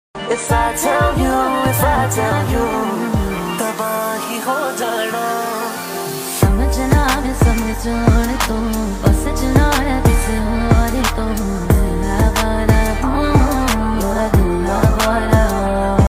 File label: ai remix trap ringtone, punjabi ringtone